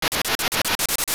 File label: Owl Fly Away; The Owl flying away